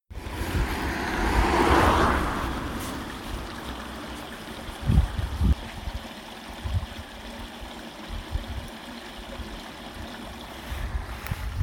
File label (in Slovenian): zvoki narave